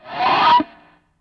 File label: GTR, GUITAR FX